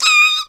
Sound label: Squeak; Sheerow (Ravio's pet) squeaking; Zelda